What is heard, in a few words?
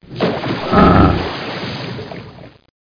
FROG MIX